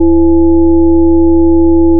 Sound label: additive cello single; FFTimbre